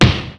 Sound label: attack; dota; marci